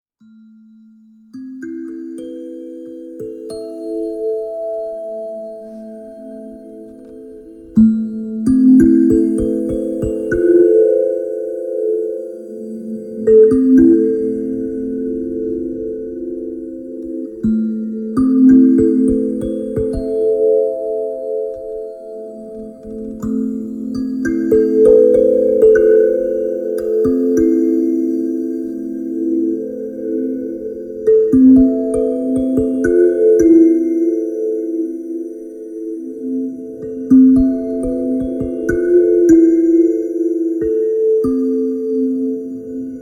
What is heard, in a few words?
Sounds of my Hands